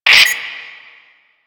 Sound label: Transmission Start; Alad V; Warframe; Quests; The New War; Veso